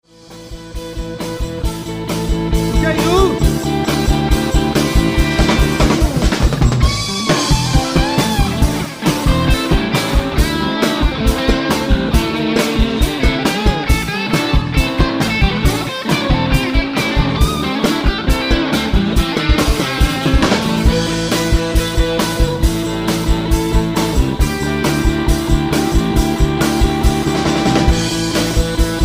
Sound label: Guitar (fragment)